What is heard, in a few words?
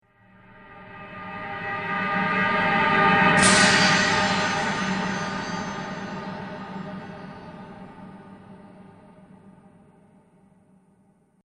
Strong Metallic Swoosh Sound; Whoosh